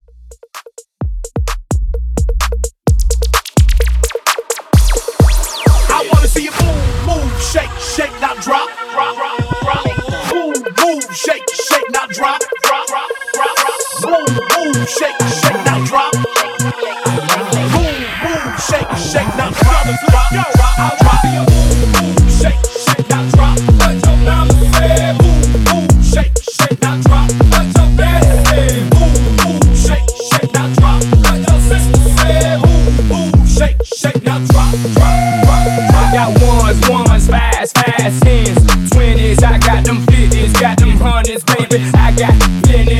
Epic Party Edit 129 BPM; 2024 Refresh

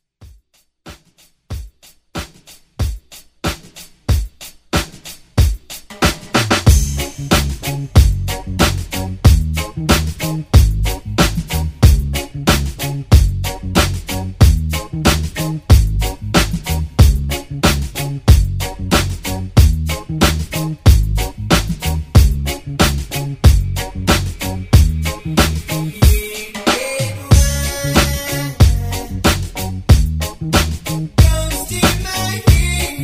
80s Reggae Redrum

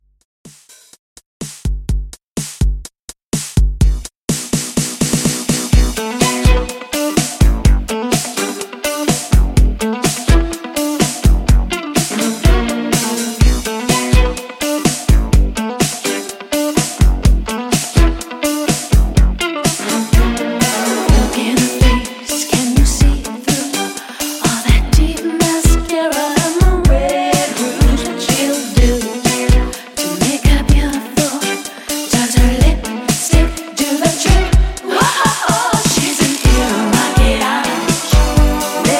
80s Redrum 125 Bpm

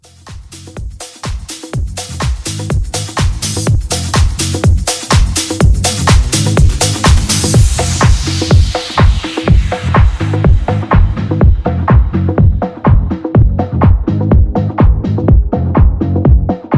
House Rework 124 CLEAN

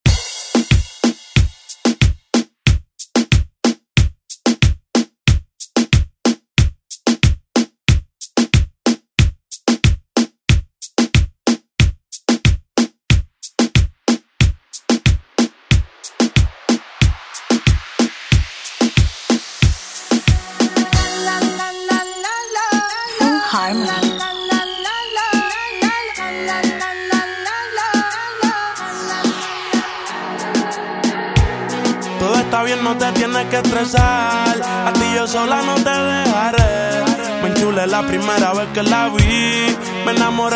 Latin Urban Redrum; Deejay Hit

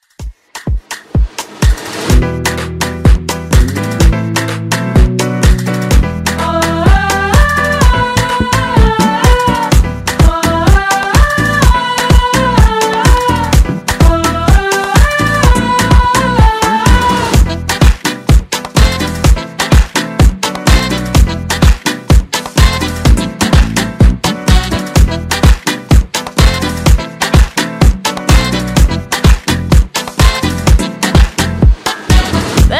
Afrobeat Redrum

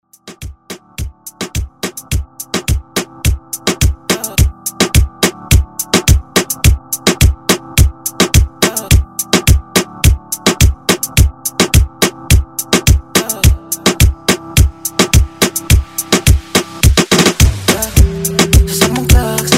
Afro Rton Redrum

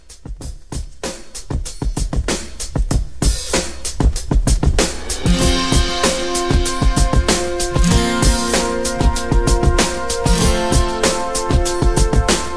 90s Club Re Drum 96

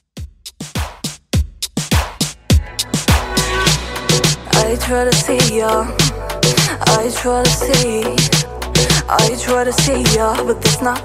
Top40 Dance Reggaeton 103 BPM

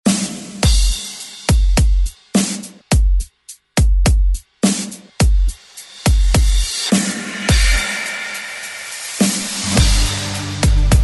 Redrum 105 BPM

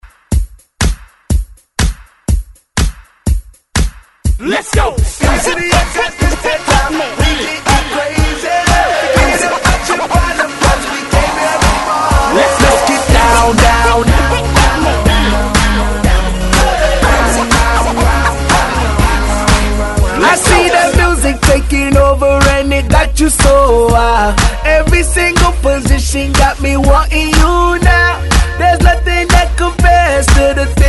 Electronic Music Hype Re Drum 122Bpm; DJDOLLS

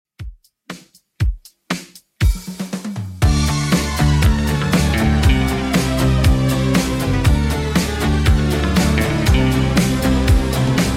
80s Redrum 119 bpm

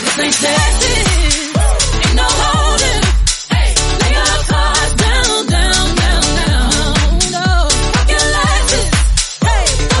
122 bpm D

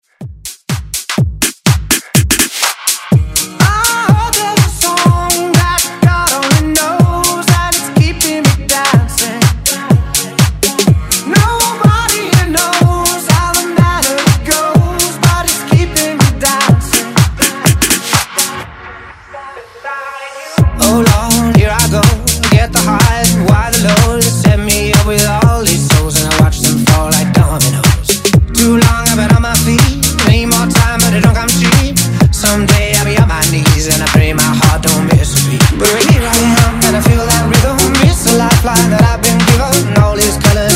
House; Redrum

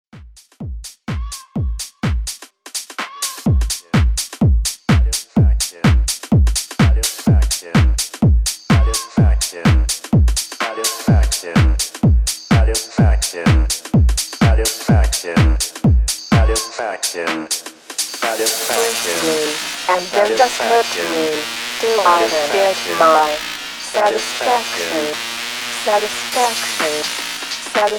126 BPM

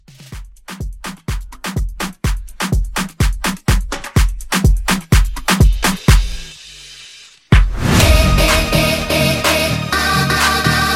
Afro Quick Hybrid Bootleg

